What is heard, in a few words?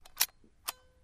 Single Camera Clicks